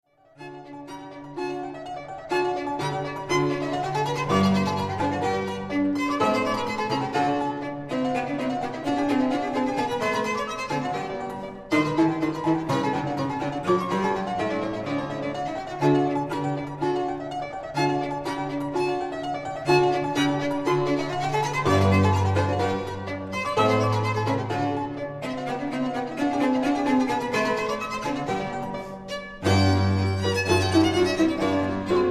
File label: Trio Fortepiano; Joseph Haydn